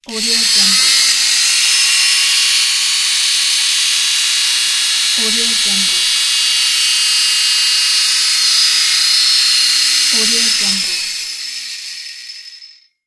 grinder

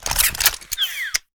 bolt breech alliance assault rifle

